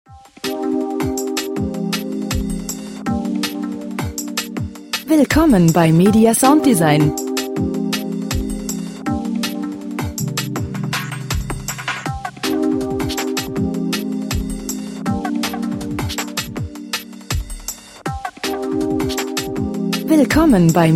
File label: percussion